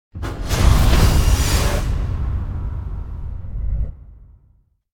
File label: Door Open Impact - Heavy Slow Motion Abstract; Hollywood Edge; sound designer's toolkit v2